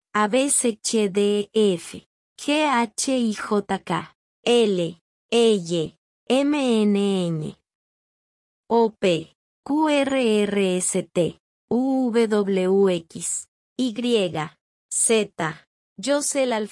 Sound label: Spanish Alphabet Marching Song; spanish alphabet pronunciation rules